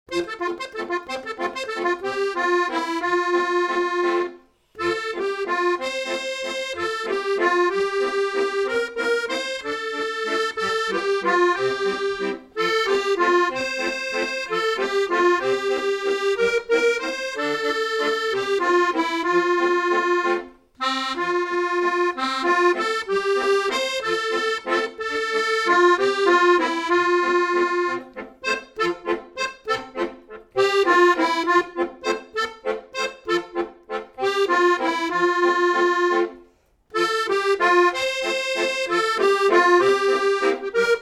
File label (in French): lo tchaint des p tets djasous accordeon